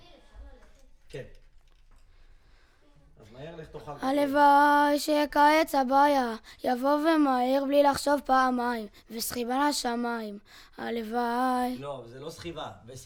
VOC

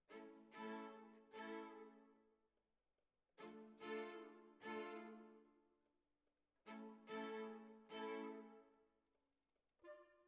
Violins Over

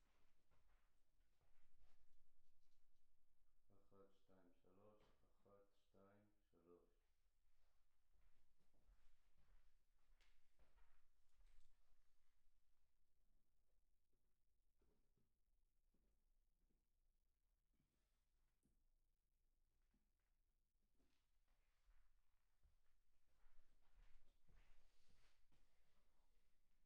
PERC OH

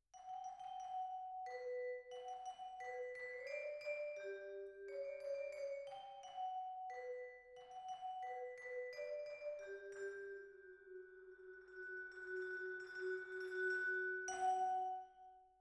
Twin R Vibra

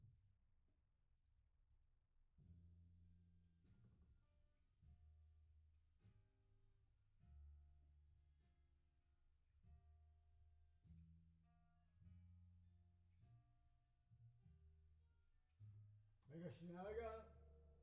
Piano B

